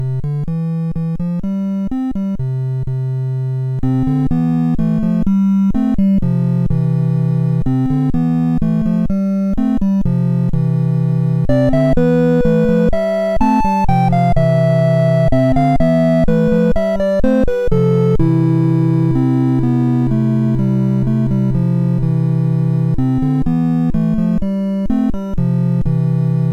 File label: Oldskool Song, MOS6581R4; Early Tunes